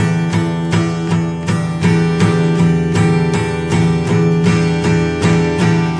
guitar; MELODIC LOOPS

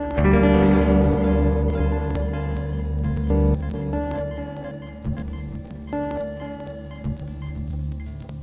abstract chord n clicks; GUITAR LOOPS

